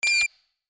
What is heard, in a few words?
Door access granted